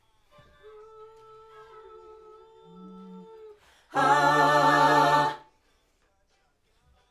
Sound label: Backs AKG